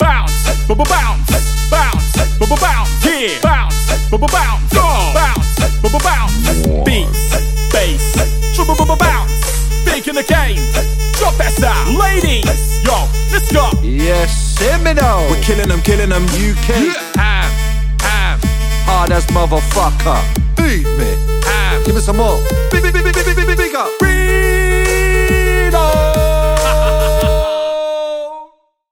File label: Mc Vocals